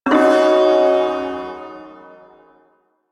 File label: Shawzin Yo Chord; Warframe; Duviri Ersatz Shawzin